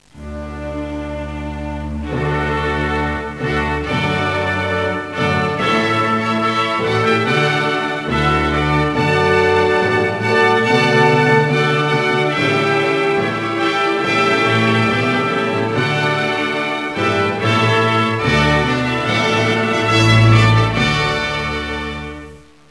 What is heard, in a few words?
brass